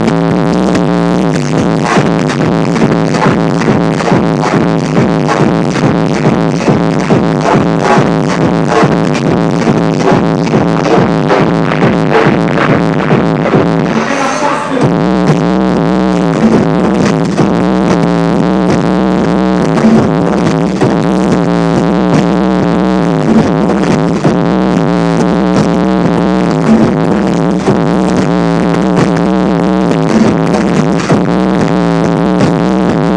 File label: gonzo hard noise; beaverworks